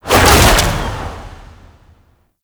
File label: Infested Tendril Whip Long, melee attack; Zeke; Warframe; Infested Lich